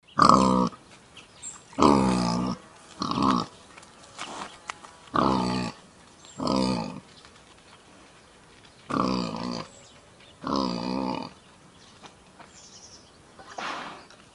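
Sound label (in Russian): wild boar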